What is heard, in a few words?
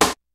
KICK SNARE; Kid Nepro; Elite; KICKS SNARES